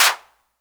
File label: CLAP, drum kit; Polyend; Stazma & Concrete Collage